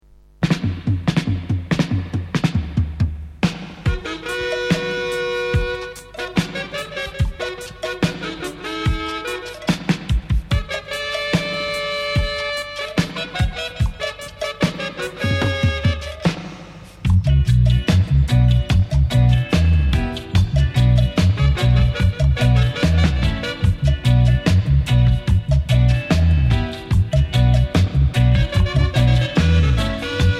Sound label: Dub Piano; Bristol Archive